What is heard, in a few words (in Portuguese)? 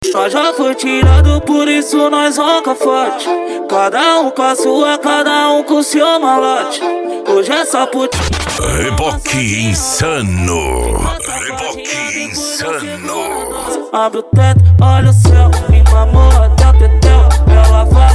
noia dance e funk mega bass